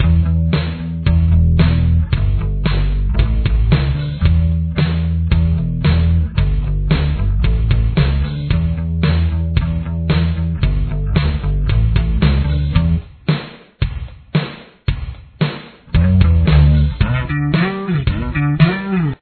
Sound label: verse bass